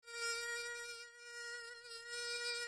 moustique; Un moustique; Bruitages

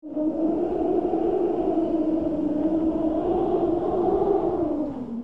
vent; Bruitages

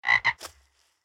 grenouille; Bruitages

